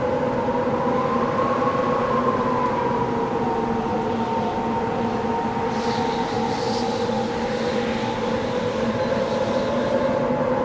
Mountain Window Wind Tunnel Sm Loop Stereo; Journey

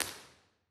Early Reflections Town Hall R; Beer Sheva; Impulse Responses; Ableton Live 12 Lite

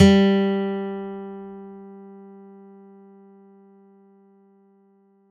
Mallet Guitar G2; Multisamples; Ableton Live 12 Lite; Core Library

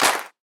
Clap Super Duper Layer, One Shots Drums; Ableton Live 12 Lite; Core Library

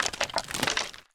skeleton fall over

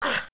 jump; Genesis3D

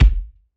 Kick Solid Jazz

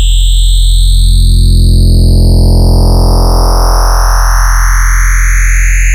Plastic Shimmer; Ableton Live 12 Lite; Vector; Sprites

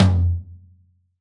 Tom; Ableton Live 12 Lite; Core Library; Drums

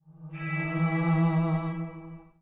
SFX SOLO mix; Journey

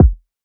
Kick; Ableton Live 12 Lite; Core Library; Drums

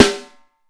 Snare Leedy

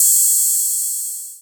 Ride Thin Synth; Drums

